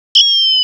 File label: Siren Countdown Minor Haptic; iOS 26 Sounds